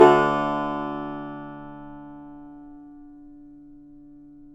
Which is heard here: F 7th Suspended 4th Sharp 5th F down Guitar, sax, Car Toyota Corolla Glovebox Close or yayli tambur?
yayli tambur